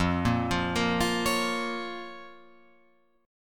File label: F Suspended 4th Sharp 5th F down Guitar Open F; Fsus4#5 arpeggiated; JGuitar